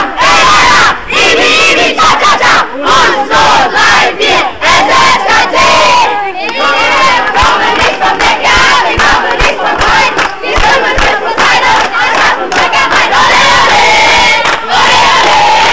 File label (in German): schlachtruf